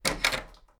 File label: door open; svencoop